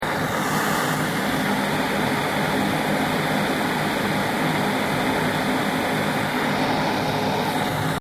MACHINERY AIRCONDITIONER VENT ACTIVE; Industrial machines; Machinery (Sound Effects:Machines)

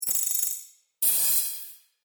Robot Factory Noises; Robot Sound Effects

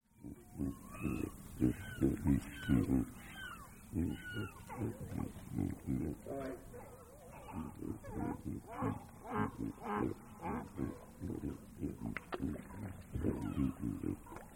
Ducks Walking And Talking Then Splash Into Pond; Animals

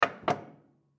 Stove Wood Burning Latch Door Shut Antique; Door Closing; Household; Blastwave

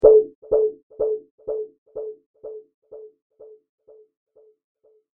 Shifting Boing Sound; Boing Sound Effects; electronic; Andrew Fleming

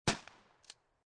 12 Gauge Barrel; 870; War; VRSonic

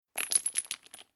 Handling Old Heavy Rustly Chain Dropped On Floor

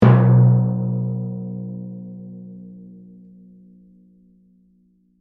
Tympani Drum Hit; Hit Sound Effects; Musical; SoundEffects-BlastWave

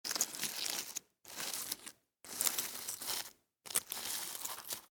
Ant, Drag, Object, On, Dirt, Insect, Bug; Sound Effects:Animals:Insects

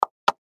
Button Click Large, Button Click Slow In Out; Objects; Blastwave; Foley

